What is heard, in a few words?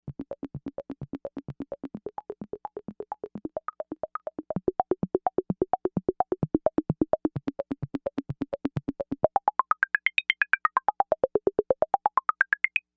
Magic Mallet; Novation UltraNova; Factory Patches